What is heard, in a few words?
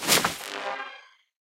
Deathrest Casket Enter